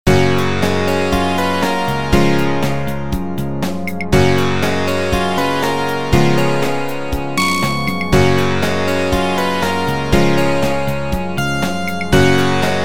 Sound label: loop